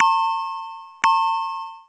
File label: Low Battery; sgh-d720; Digital